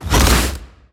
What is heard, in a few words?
bighorn big hole open